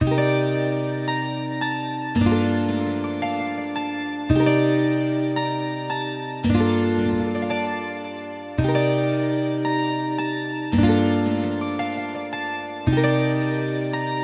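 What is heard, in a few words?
Guitar Loops D minor 112 BPM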